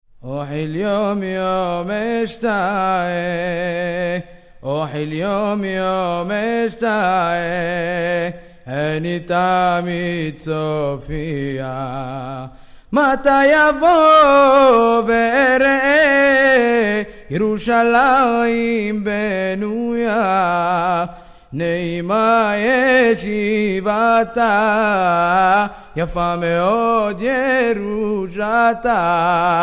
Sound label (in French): chants des juifs du maroc; ohil yom